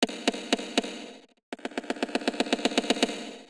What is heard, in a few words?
rimshot